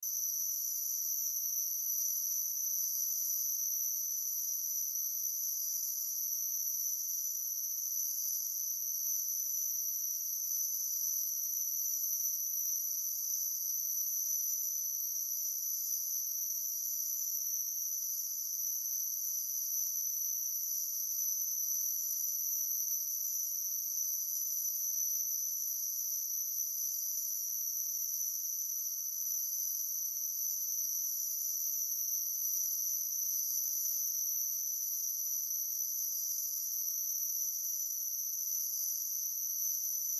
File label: Insects High Pitch, Insects High Pitch Chirping; Animal; Blastwave